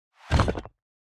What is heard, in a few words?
Stone Sound